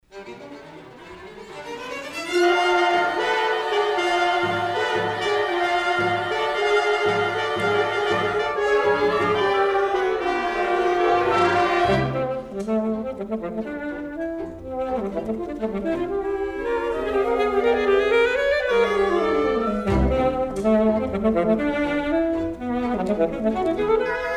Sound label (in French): concerto da camera pour saxophone alto et onze instruments Allegro con moto; IBERT